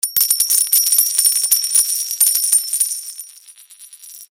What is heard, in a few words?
new packet